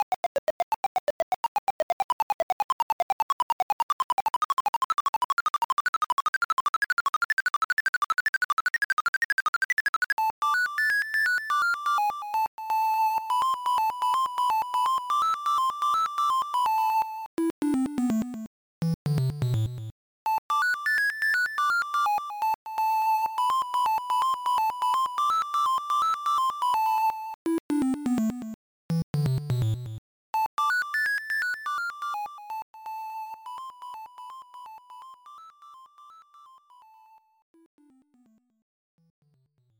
Unused Jingle; virtual boy wario land gamerip

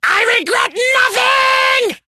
Taunt Death; Hero Tramin

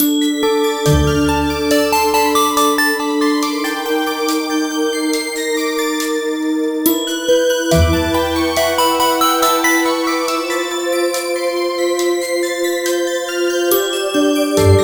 BGM Spring

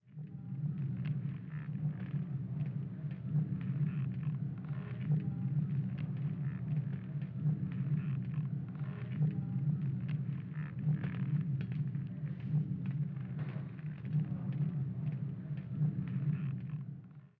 Amb Deathstar

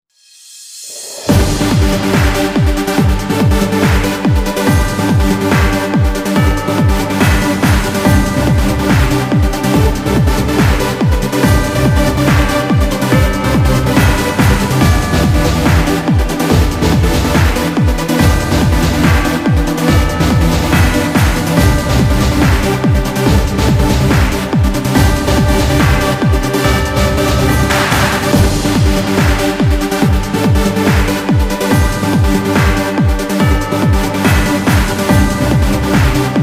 Trance Anthem; pro evolution soccer 6

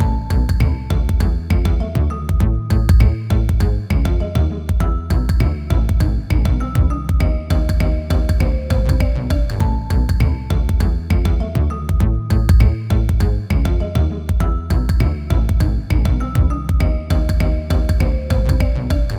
Adventure Loop

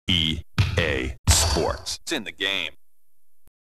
Logo (Sony PlayStation Version); EA Sports

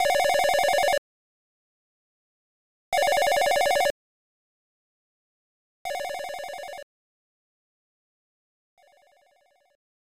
Telephone; ryukiheidan danzarubu; snes

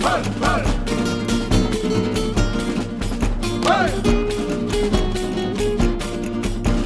music world music shooter swarm

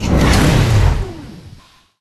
Rocket Nitro boosters

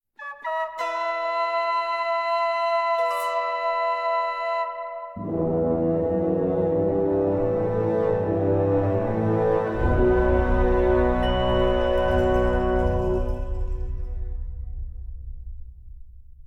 Music Stem; Level 21

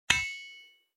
CRAFTING

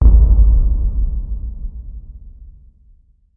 Subwoof; chivalry medieval warfare 2012 pc

